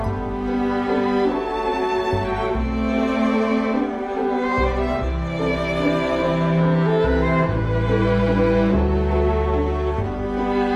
music map loop; Candy Crush Friends

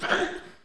death; FlyForInsanity

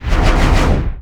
skill sword wind; FlyForInsanity

